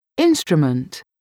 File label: instrument